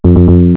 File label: tune